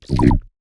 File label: Chaser Alert